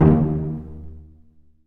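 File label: cello pizzicato D#3; Digital Sound Factory Virtuoso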